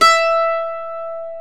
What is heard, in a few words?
E4 MANDOLIN